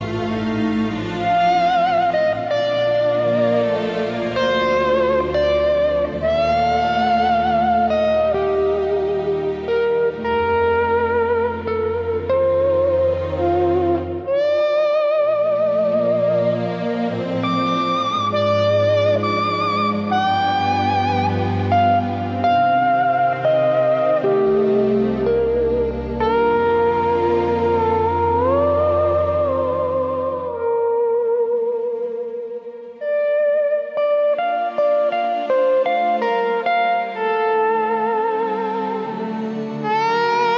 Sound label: instrumental